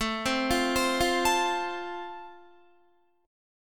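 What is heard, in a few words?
A Minor Sharp 5th, Guitar, chord strum; Am#5 arpeggiated; JGuitar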